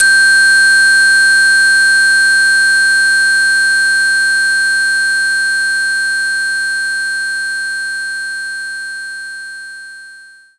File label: glock G6